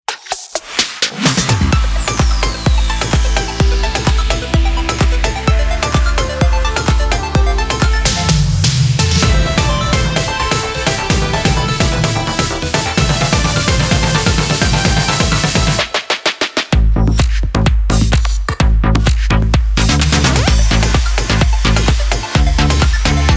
Jingle B Ramp; Europa Plus

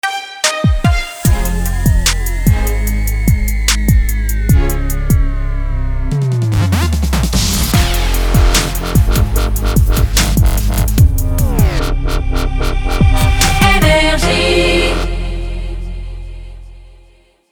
Jingle Ramp; NRJ

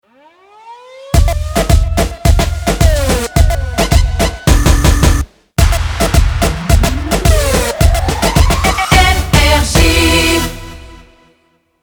Jingle Ramp; NRJ

